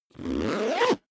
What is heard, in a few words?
zipper backpack close accelerate